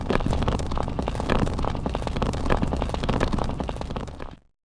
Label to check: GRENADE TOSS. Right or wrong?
Right